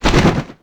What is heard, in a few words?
flapping